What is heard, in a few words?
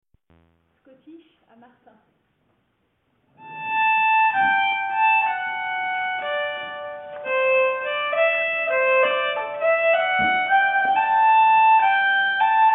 Scottish, nyckelharpa; Ernest Scottish